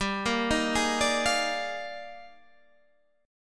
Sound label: Eb Major 11th G, guitar